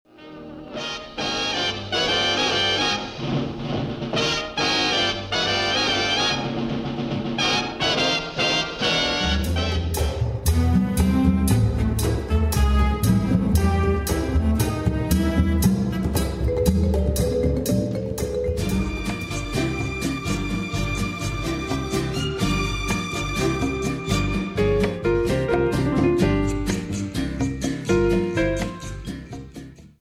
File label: Medley Jazz Big Band